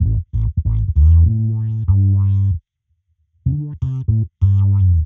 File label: Bass FX 95bpm A; musicradar; dub designer